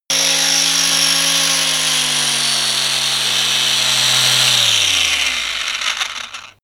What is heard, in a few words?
saw stop